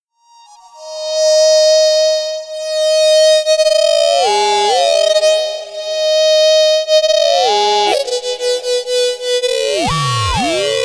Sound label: cs60 Solo